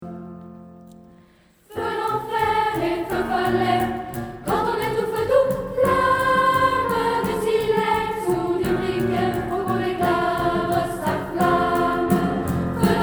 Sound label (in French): Jeux de feu refrain